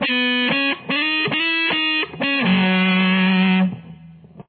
lick, guitar

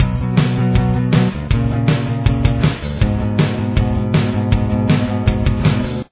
verse bass

